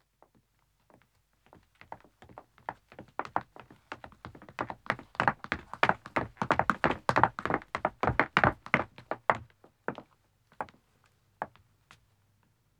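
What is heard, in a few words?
Wooden Stairs Descending, 2 Women, footsteps; BBC Sound Effects Library; Footsteps II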